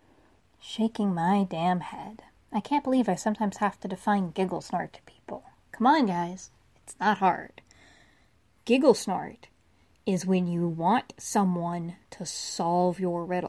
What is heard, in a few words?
giggle snort